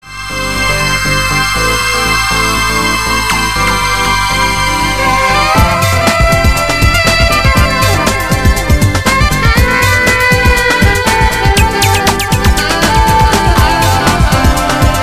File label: wavestation song